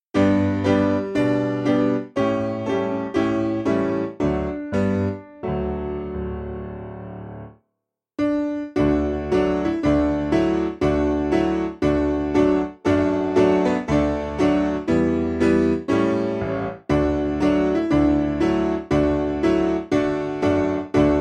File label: Piano; I've Two Little Hands